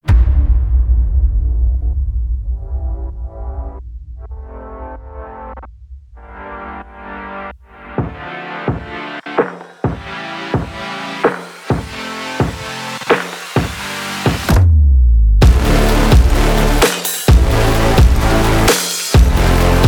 abstract future bass

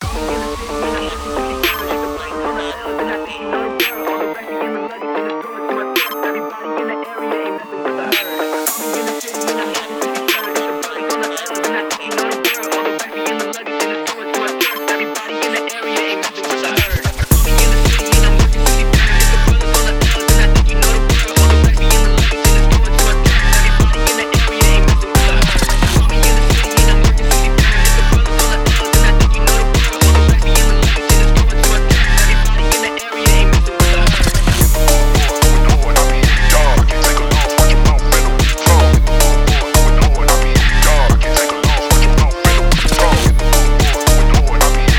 phonk

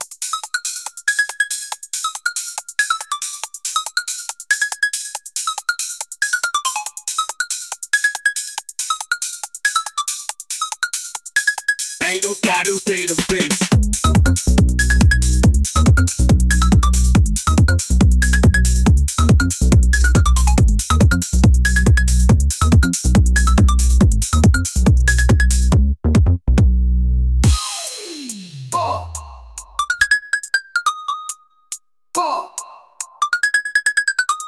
bassline bump bass

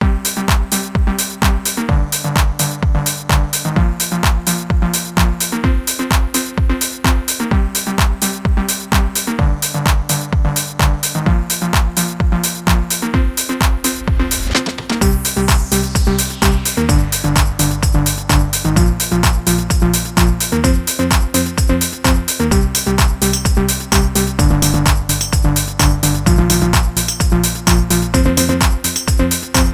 phonk house music

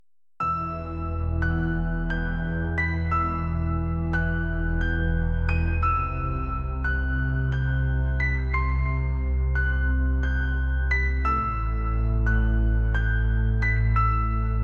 neurostep nightmare bass

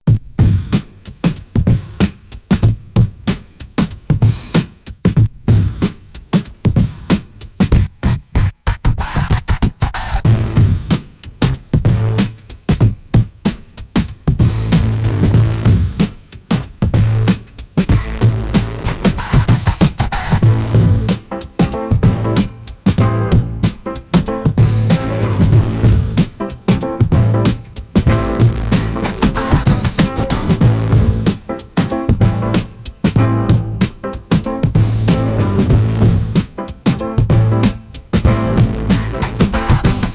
groove machine

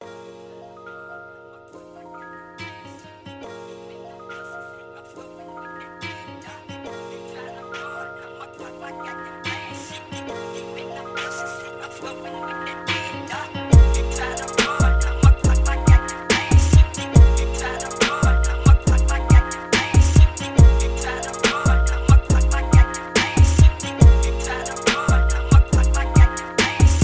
phonk memphis chill

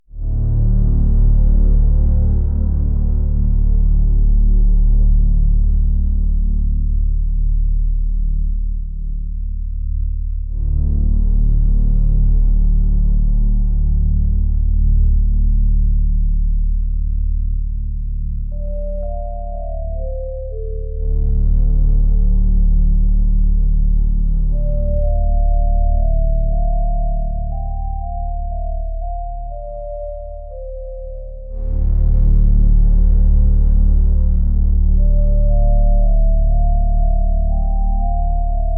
industrial aggression bass

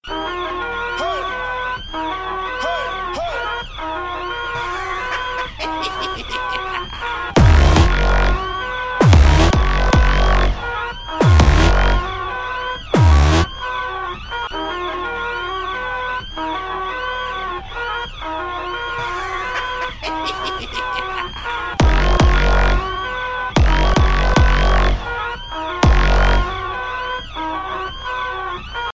phonk

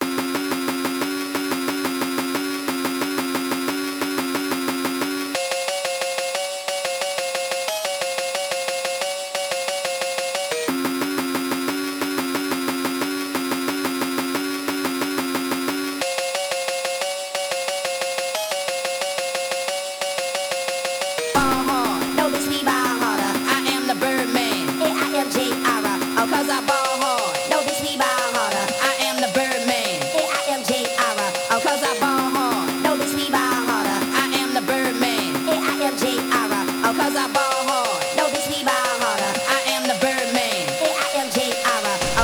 dark high speed trap phonk

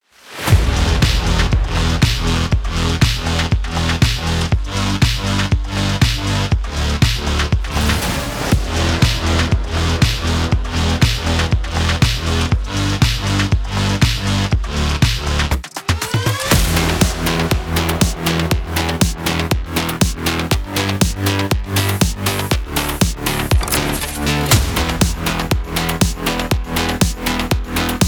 electro cuberpunk

